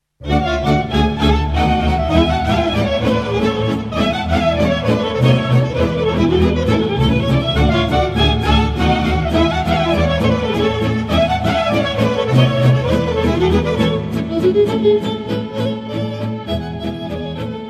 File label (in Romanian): Brau, Roumanie